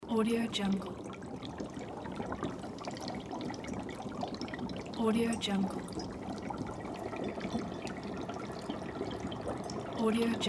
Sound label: laboratory potion ambience